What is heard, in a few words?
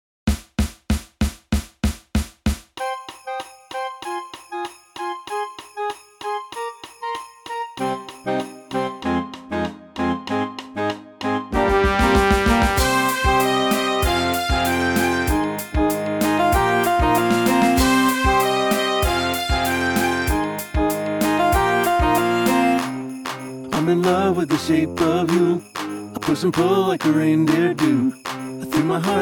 Holiday Parody Marching